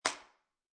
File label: Pill Bottle Place Down, Foley, Pill Bottle, Plastic, Placed Down On Counter Top; Set Down